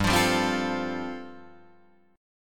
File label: G 9th Suspended 4th, Guitar DADGAD